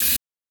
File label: carbonation malfunction